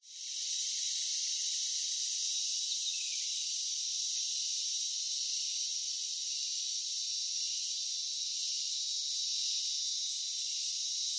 nature rural ambience australia bush summer insects birds midday; zapsplat